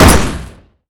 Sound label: shotgun